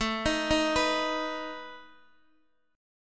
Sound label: A Suspended 2nd Flat 5th A down Guitar Open D